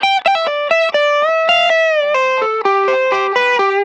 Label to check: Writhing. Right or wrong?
Wrong